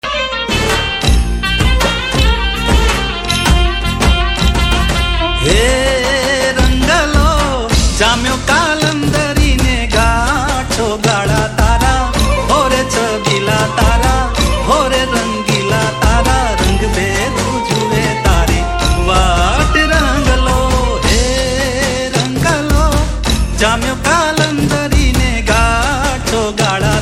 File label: gujrati ringtone